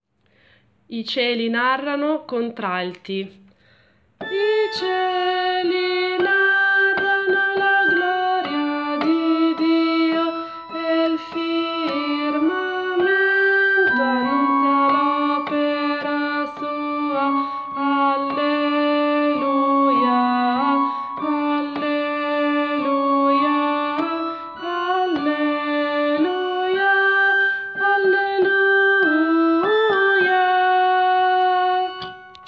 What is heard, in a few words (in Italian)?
contralti